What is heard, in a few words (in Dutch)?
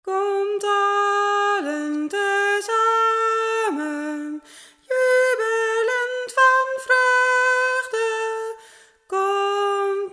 Komt allen SOPRAAN